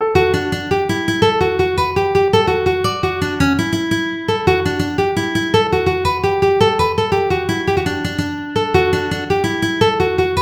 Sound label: ukulele